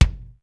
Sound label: pure kick, sr16 bd